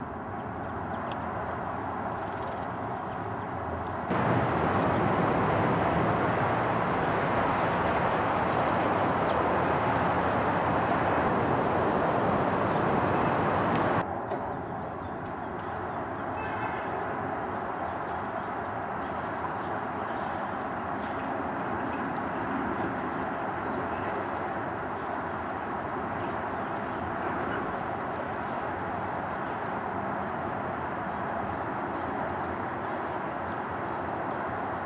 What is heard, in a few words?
Brown-headed Cowbird